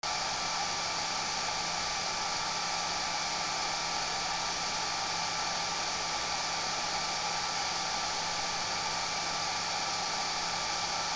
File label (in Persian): صدای سشوار آرامش بخش